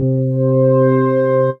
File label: Room Organ; Ableton; Factory Packs; Retro Synths; P-50 Linear; P-50 Structures; P-50 Partials